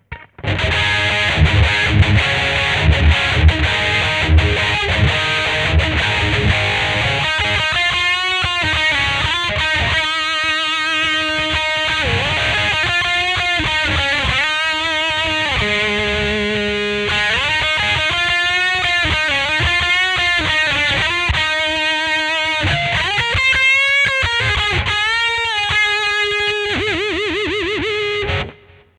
JSX dist, guitar

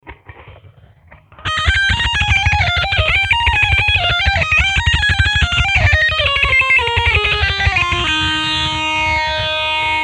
6505 dist, guitar

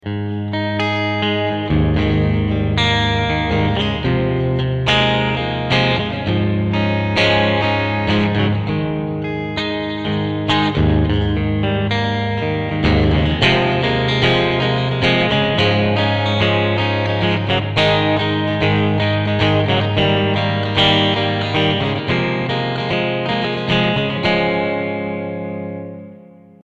plexi clean, guitar

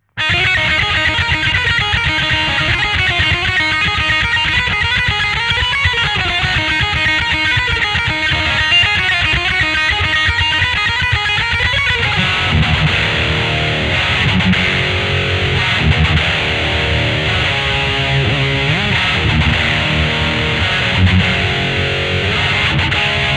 XXX dist, guitar

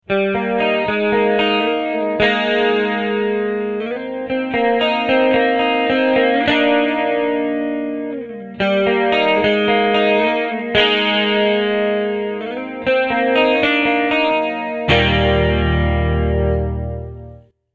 squeeze chorus, guitar; squeeze bad cat chorus

